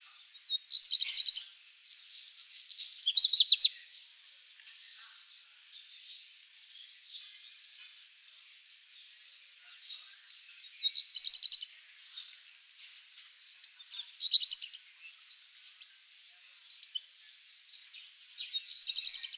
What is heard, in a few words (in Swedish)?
Svart rodstjart